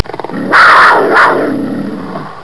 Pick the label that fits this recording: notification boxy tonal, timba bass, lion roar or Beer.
lion roar